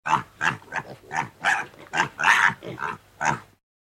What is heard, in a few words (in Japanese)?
猫; 動物; 豚